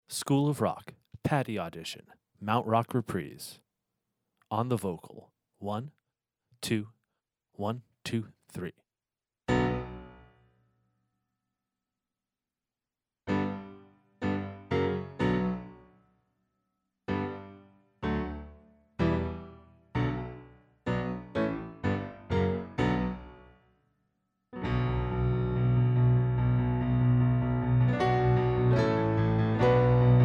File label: accompaniment